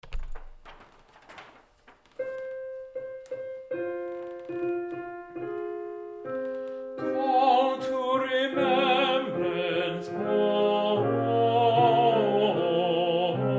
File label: Call to Remembrance Tenor; Call to Remembrance, D. Farrant; Lent; Choral Resources